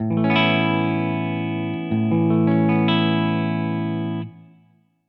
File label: Aadd9, chords pop